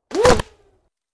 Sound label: Bullet Impacts Wood I